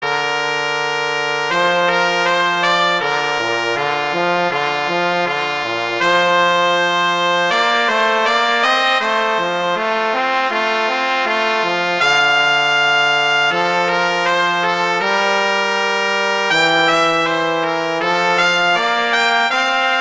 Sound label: Duet; Mozart; Duets